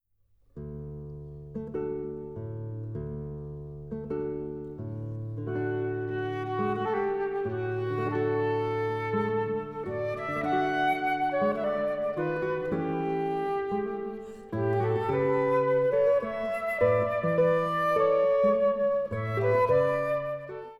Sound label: tango, guitar